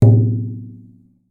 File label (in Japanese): 太鼓の音 生音